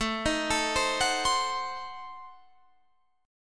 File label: A Diminished 7th A, Guitar Standard; 17,18,19,17,19,20; arpeggiated; JGuitar